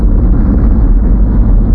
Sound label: eng hum; The Elder Scrolls III - Morrowind